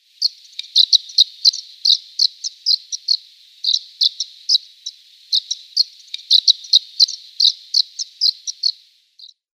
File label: White-rumped Sandpiper calls